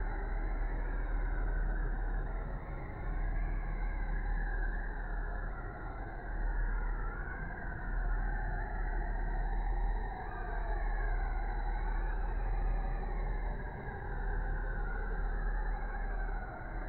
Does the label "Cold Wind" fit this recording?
Yes